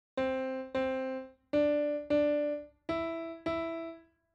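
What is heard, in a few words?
unisons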